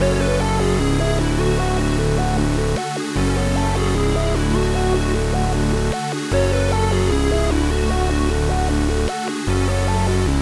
152 BPM F# Min, Melody; Cymatics; 2022 Melody Collection; Wavy

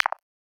Perc, Psytrance; Hats & Percs

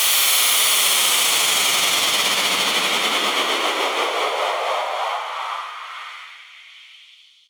Machine White Noise Down; House; FX

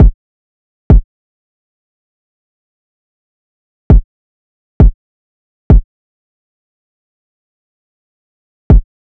Drum Loop 100 BPM Kick, West Coast; Loop Stems; Cymatics; GHOST (Beta Pack); Flow Drum Loop

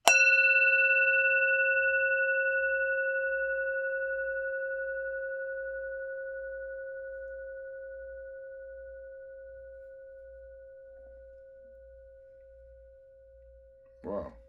Bell Clang Resonance High; Fairlight Sound Lib

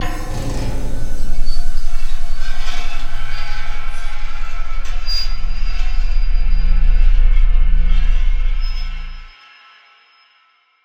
Weird scary

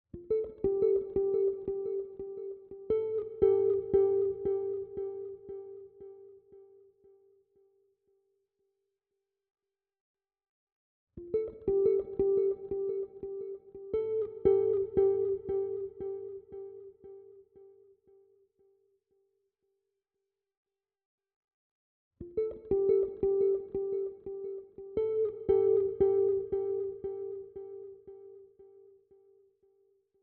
87 BPM D Min Guitar Lead, Afrobeats; Melody; Loop Stems; Waterfall; Cymatics

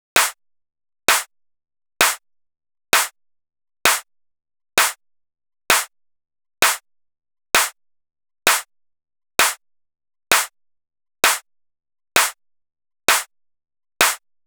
Drum Loop - 130 BPM Clap; Loop Stems; Cymatics; DOPE DRUMS VOL. 1; Steam

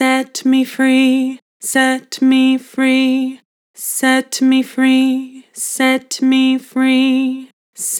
Vibrato Set me free, Harmor Vocals

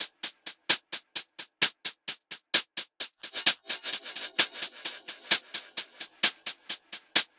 Hat Loop 130, Techno

